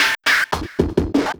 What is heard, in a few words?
loop, 170 BPM, drum & bass; Ueberschall; Drum & Bass Flash Trackz